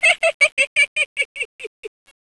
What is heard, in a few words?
laugh female